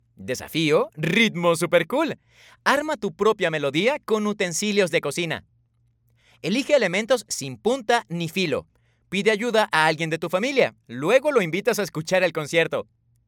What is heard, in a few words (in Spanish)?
reto ritmo